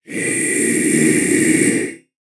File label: Skeleton Warrior Attack Vocals